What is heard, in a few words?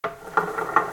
Platform move gear